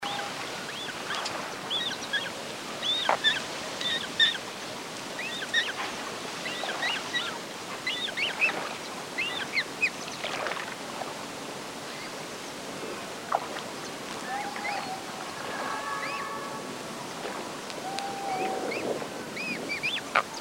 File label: Andean coot